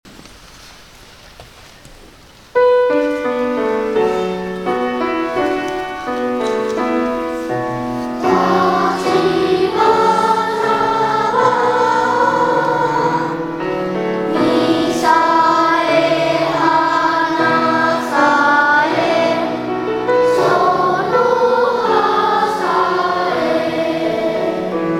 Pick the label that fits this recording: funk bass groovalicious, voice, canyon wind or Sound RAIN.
voice